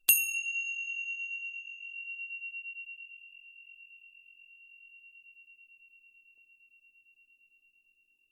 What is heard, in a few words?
tibcymb distant weak sound